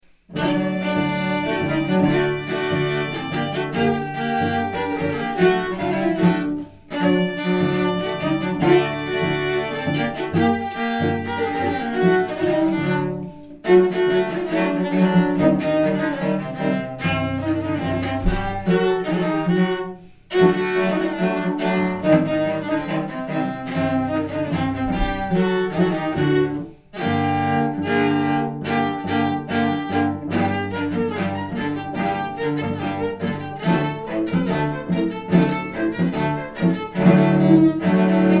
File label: Cello Quartet; 4 Cellos; Advanced; Hava Nagila